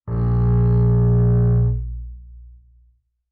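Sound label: Bass arco fortissimo sul E B2, Double Bass; MIS Pitches - 2014; Strings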